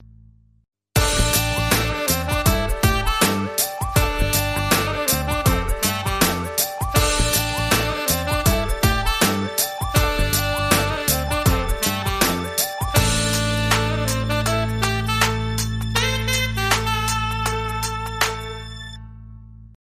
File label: Hip A Soul Saxophone